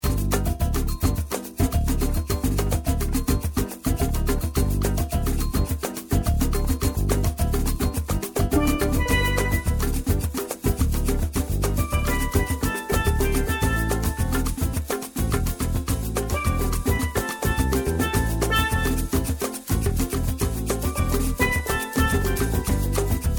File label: Steel Drum Player; Boston